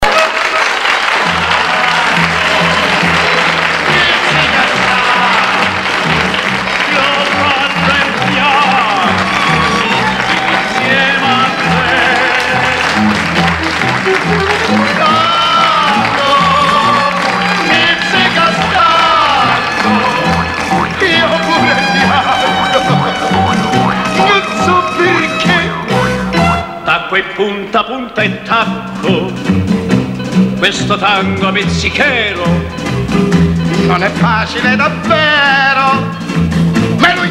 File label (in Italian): tango